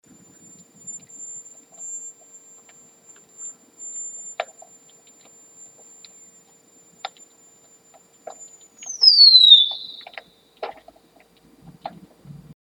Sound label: seal Weddell